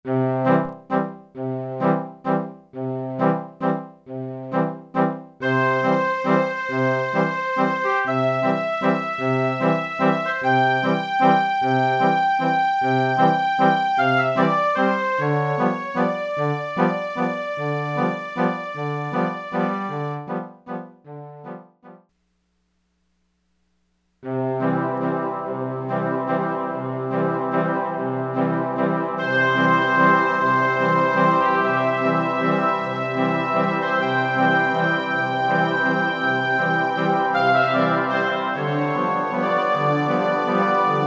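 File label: Large Room - Large Hall, Roland SRV-3030; Preset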